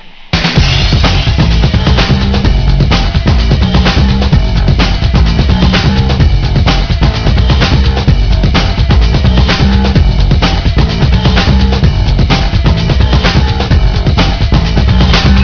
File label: lobby